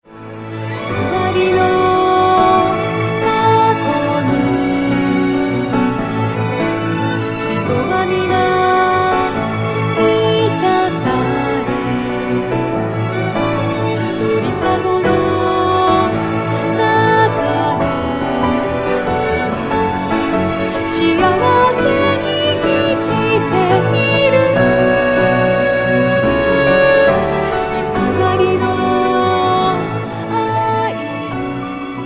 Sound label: Fragment Acoustic; Lethe